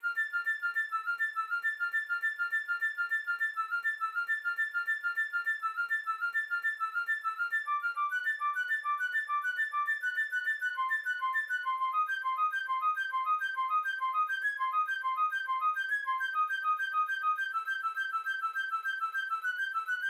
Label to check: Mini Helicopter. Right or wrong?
Wrong